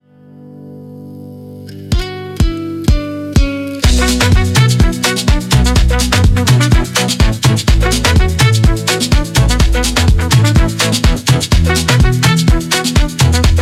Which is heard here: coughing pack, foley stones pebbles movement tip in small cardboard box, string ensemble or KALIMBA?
KALIMBA